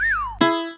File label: pisk down cink; sound-icons